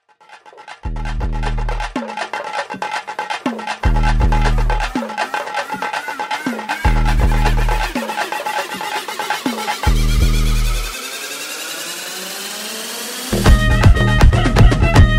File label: climax fight bgm ringtone tamil ringtone; maaveeran